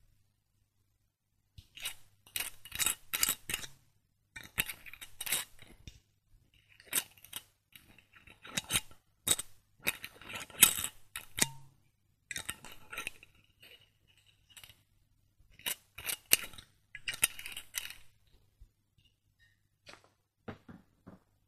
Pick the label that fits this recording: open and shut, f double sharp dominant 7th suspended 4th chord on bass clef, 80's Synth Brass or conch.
open and shut